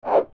flap

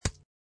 kernel pult

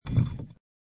grave button

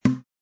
plastic hit

